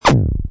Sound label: Zap, Button sound; Zaps; Lynne Music